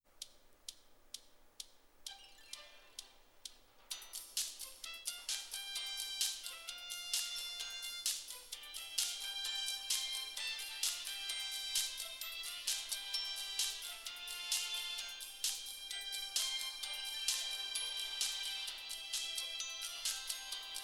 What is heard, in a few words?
headphone music